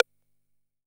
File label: KR RIM, KR55 KIT; INIS - Funky Xtreams